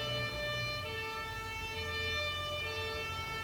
siren, emergency vehicle

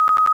notify

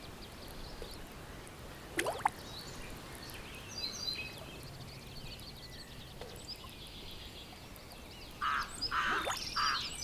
swamp, ambiances; Cosmic Rage

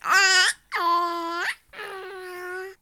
waves, baby; CosmicRage

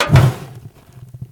light, grilling

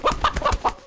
hurt; CosmicRage; combat; creatures; chicken

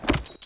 trot, horses; CosmicRage

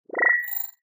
popup; CosmicRage

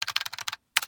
mini keyboard; trash collection

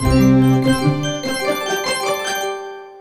save

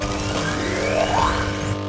charge, battlesuit; general combat

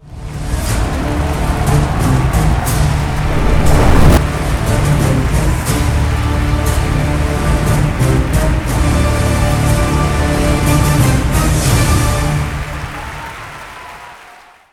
hardcore

